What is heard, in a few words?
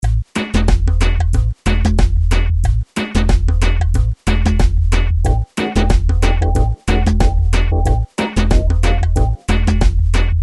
reggae